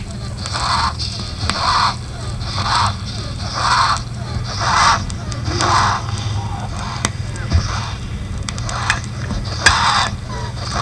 sibilo, som pediatrico; CHIADO EXPIRATÓRIO; PEDIATRIA UFF

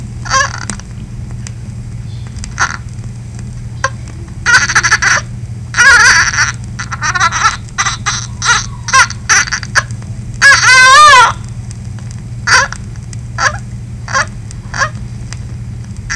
gemidos, som pediatrico; GEMIDO EXPIRATÓRIO : MEMBRANA HIALINA; PEDIATRIA UFF

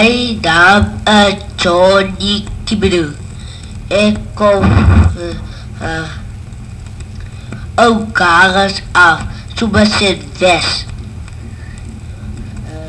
puberdade precoce, som pediatrico; PEDIATRIA UFF

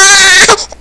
CHORO, SONS PEDIÁTRICOS; PEDIATRIA UFF

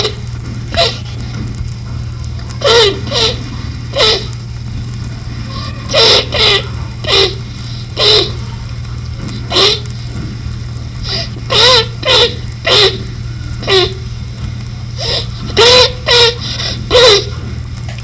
tosse psicogenica, pediatrico; Disco Vinil produzido pela Pfizer Laboratories; PEDIATRIA UFF

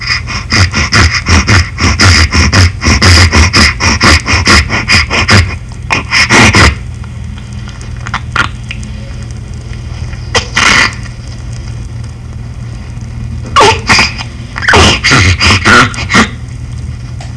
obstrucao nasal, som pediatrico; PEDIATRIA UFF

